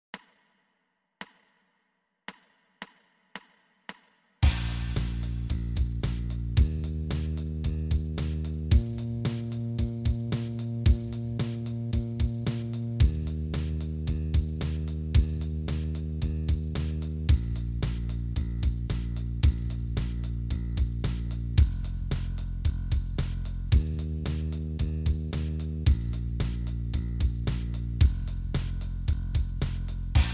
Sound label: bass startup bb blues 112